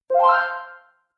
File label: outro Wheel